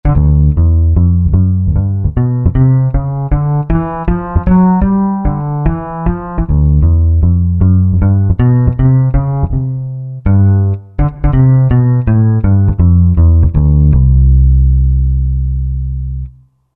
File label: Bass; Amigo Bass; Roland SR-JV80-18 World Collection Latin